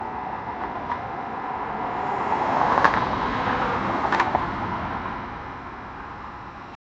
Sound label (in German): driftendes auto was in ei, asphalt